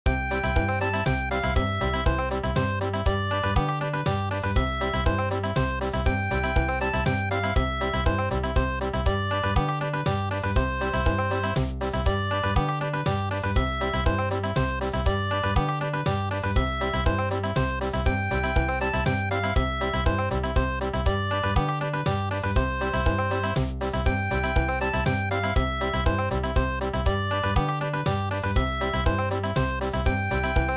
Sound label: Country, midi; Ach Du Lieber Augustin